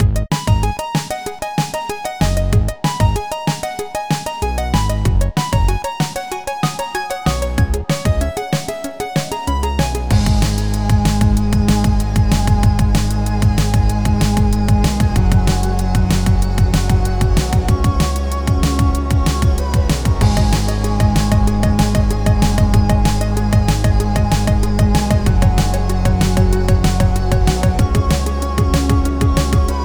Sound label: EMISYNTH; Strix Instruments LLC